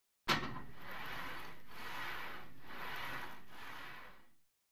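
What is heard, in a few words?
Gym Weight Equipment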